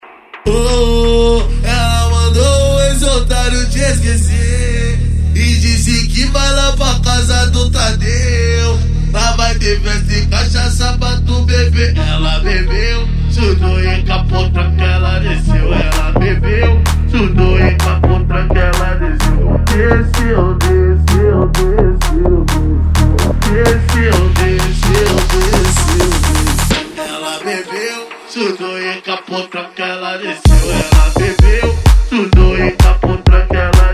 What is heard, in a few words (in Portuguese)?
eletro funk e sertanejo